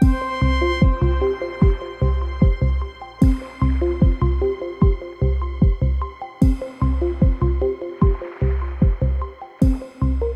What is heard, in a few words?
Tension Boops, Loops